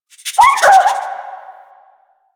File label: Rabbit Ambient Call; Duviri; Animals